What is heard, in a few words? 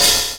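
open hi-hat funk, drum kit; E-Lab; X Static Goldmine 2; akai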